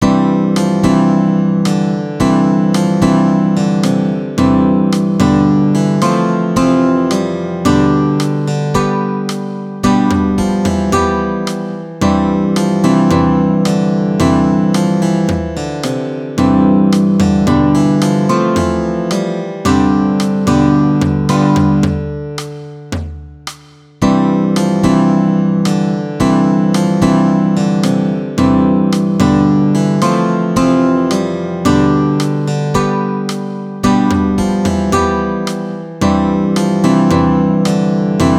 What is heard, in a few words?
acoustic; Free Software Song